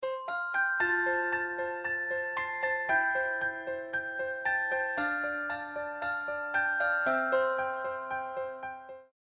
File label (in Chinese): 轻快